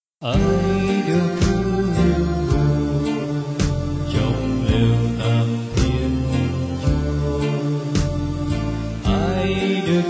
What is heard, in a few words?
BASS